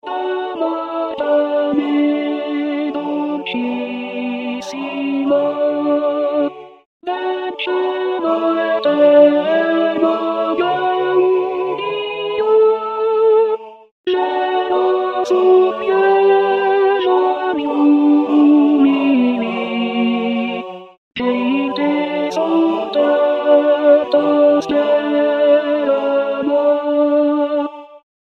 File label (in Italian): contralti; d'amor pane dolcissimo